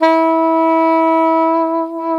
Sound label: SAX E2, SAXOPHONE; USB Soundscan vol.63; Balkanish Traditions; AKAI